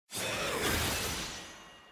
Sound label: map click; evelynn